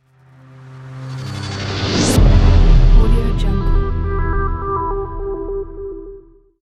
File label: cyberpunk trend bumper logo